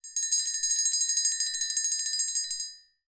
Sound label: bell small ring